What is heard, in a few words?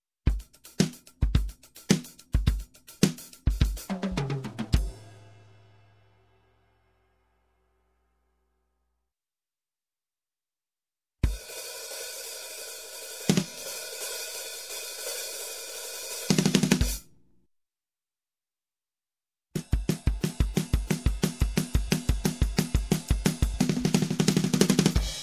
Drums rock Tag, Music, Percussion; Sound Ideas 2000 Series